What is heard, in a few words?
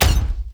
Step Small; Warframe; Corpus; Jackal Redux